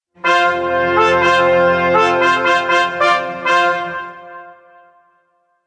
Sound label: fanfare